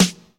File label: Snares; Vengeance Samples; VEH2